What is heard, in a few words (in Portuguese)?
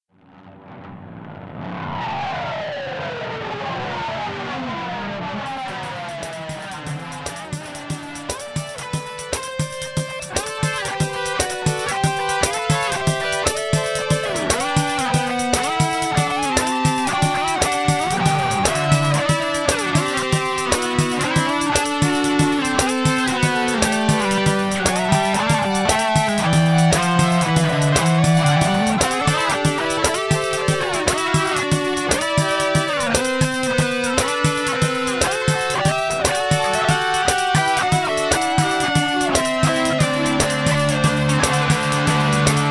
guitarrista